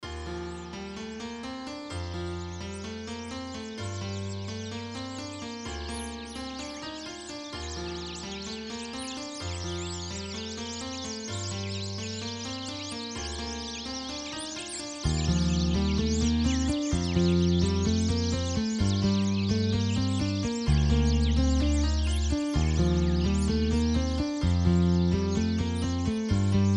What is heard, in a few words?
House Mix